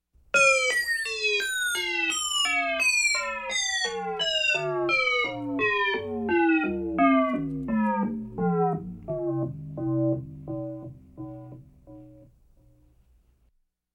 Science-Fiction Jaeher Energieabfall

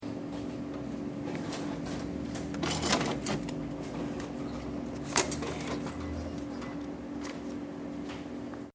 Kontodrucker Ausgabe

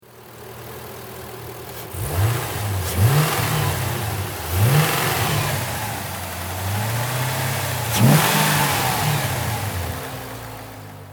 Autowerkstatt Gas geben

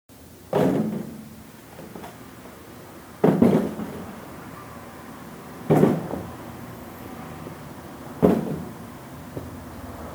Schaufel

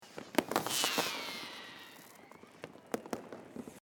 Silvester Atmosphaere

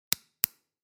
Fingernagel schnipsen

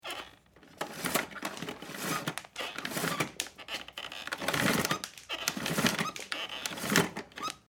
home Staubsauger kabel

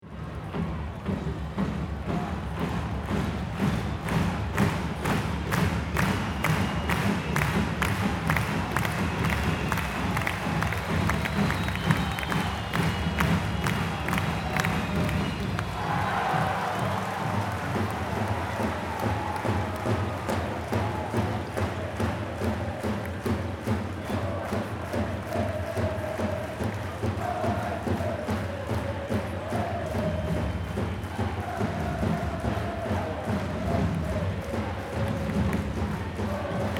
Handball Hallen Spiel Atmosphaere

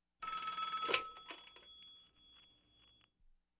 Im Buero Telefon 1x klingeln Hoerer abnehmen

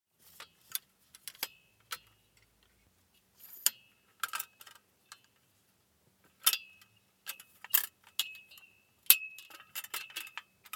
Notenstaender ausklappen

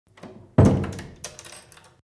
Tue schliessen

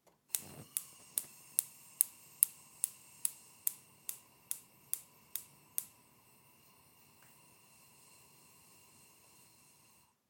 Gasherd

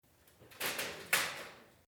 In der Garage

